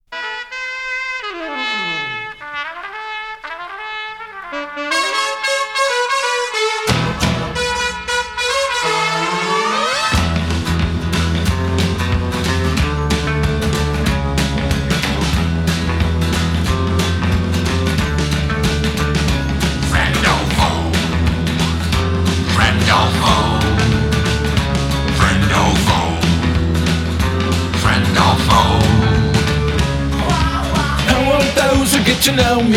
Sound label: Karaoke